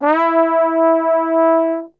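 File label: E 3 trombone glissando, Brass Super Section; Best Service; TRMB GLISS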